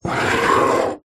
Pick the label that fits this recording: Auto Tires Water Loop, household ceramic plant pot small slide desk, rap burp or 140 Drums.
household ceramic plant pot small slide desk